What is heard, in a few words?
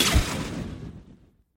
ufo laser blaster hit